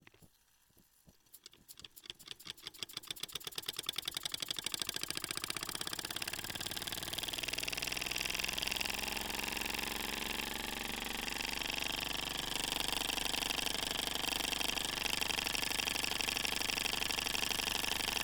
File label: Steam Engine Model - Engine - Start Run Press Machine Whistle Off - Flywheel - Right; DPA 4062; Pole Position